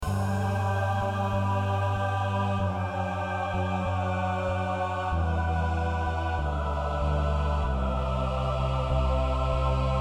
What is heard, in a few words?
lullaby underscore loop